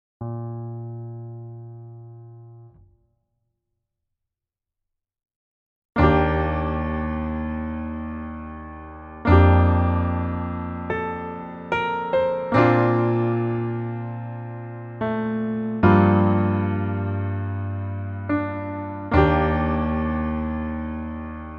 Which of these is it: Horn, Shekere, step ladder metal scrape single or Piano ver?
Piano ver